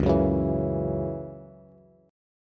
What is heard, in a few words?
Db Minor Double Flat 5th Db Bass; strummed; Dbmbb5; JGuitar